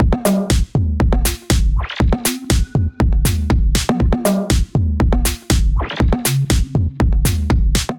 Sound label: Underwater Swords Kit, Drums; Ableton; Punch and Tilt